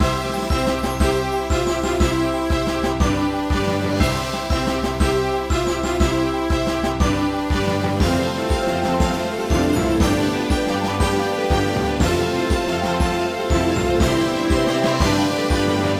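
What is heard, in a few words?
background music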